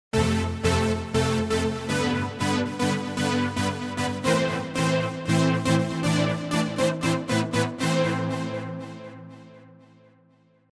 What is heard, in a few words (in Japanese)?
EDM風 Lead